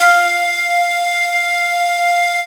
Noise F; Synthetic's Serum Collection; StiickzZ; Sticky Future Bass Vol. 2

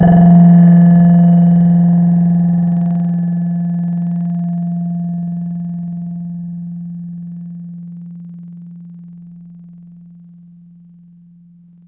Keys Islands; Synthetic's Serum Collection; ESW X Keys

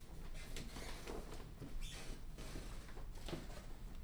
Creaking Floor; Working With My Grandma 4.28.2016

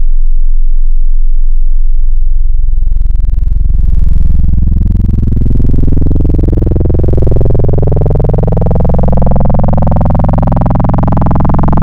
Sin Sin Mod, Wavetables; Synthetic's Serum Collection; Cymatics - Nuclear for Xfer Serum Wavetables

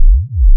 single cycle waveform; Synthetic's Serum Collection; Cymatics - Single Cycle Waveforms for Serum

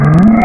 Lofi Barf; Vomit Wavetables; Synthetic's Serum Collection; Cymatics - Vomit Wavetables

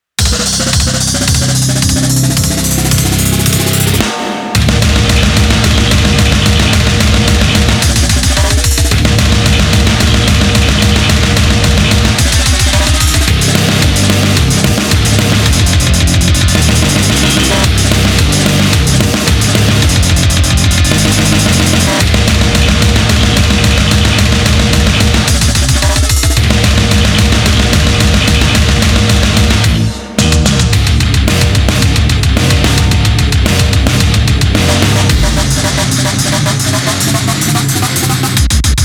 instrumental, frontcore; lolicore

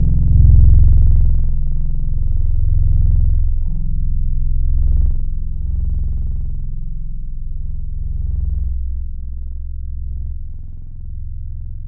flicker pluck; Synthetic's Serum Collection; Chill Trap 2 Tables

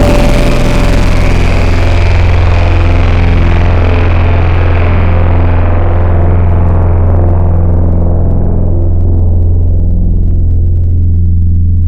Futuristic, Tropical Mallets

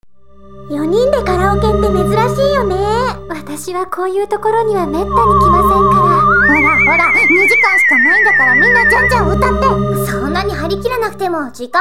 horror noise; lolicore

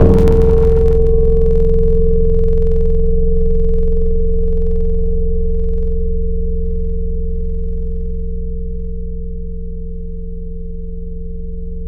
Chroma, Tropical Mallets

